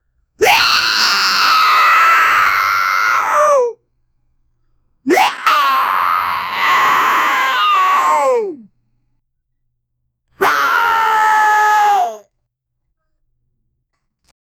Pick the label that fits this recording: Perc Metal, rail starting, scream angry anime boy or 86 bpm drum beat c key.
scream angry anime boy